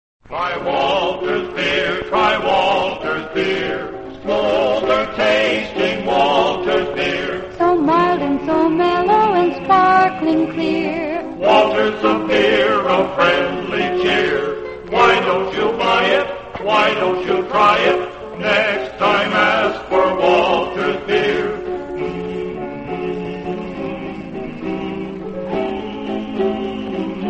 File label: radio jingles; walters beer; allied radio artists